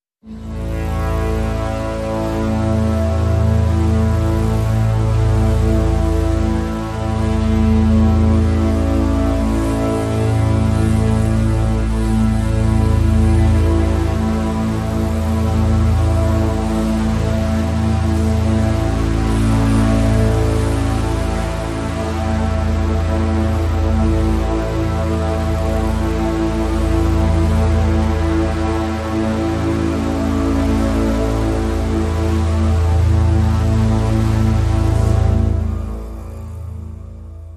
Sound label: Drn Bowed Bass A